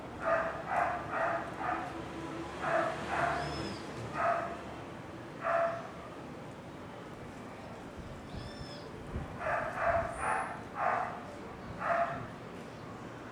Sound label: ambience